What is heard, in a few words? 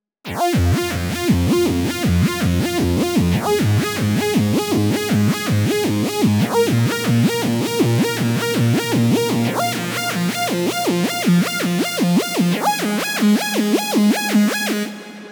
rhythmic slew lfo